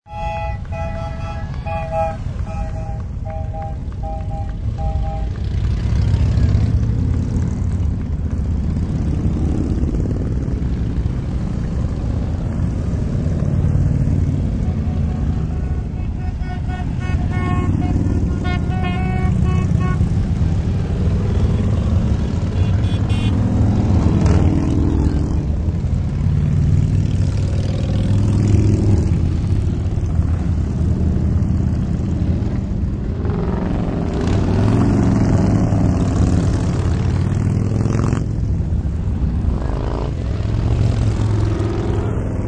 Biker Gang, Hundreds of bikers in bike gang drive by; Motorcycles; Vehicles